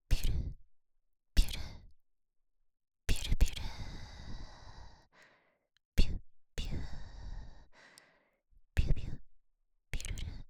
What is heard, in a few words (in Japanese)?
びゅるる, ASMR